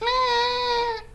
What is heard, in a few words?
Cat